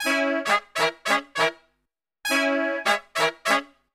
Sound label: BRASIL C#M, Brass Super Section; Best Service; BRASIL LICK